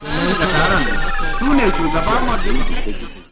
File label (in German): Radio KW